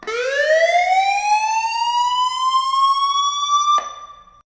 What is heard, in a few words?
shelter break in alarm; Original TK Sounds